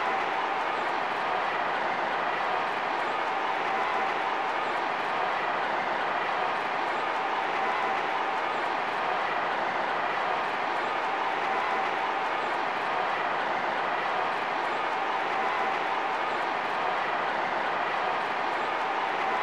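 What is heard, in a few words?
Basketball Crowd Ambience Loop; Crowds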